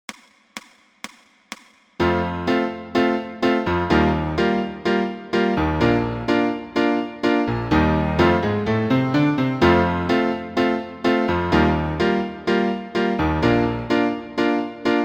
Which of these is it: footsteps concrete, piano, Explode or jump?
piano